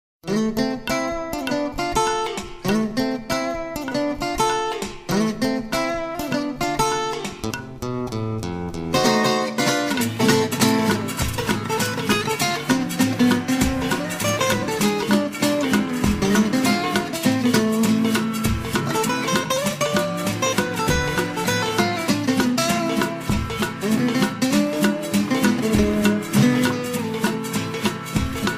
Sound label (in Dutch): pling pling extra kort